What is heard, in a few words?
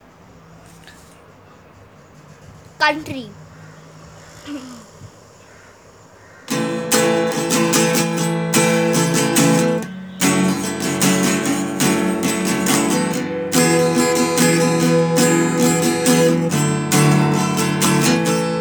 Country, Guitar